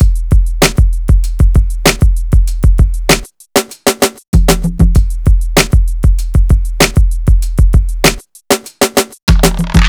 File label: E Coast HipHop Drums; Digidesign; Pro Tools; Pro Tools Essential Loops; East Coast